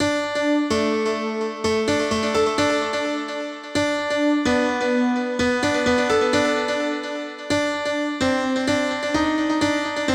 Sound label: Melody; super crush k.o.